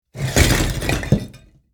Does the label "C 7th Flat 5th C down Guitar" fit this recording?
No